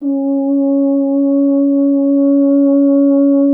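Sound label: French horn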